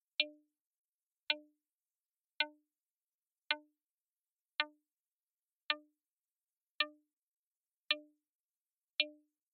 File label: turn at 300hz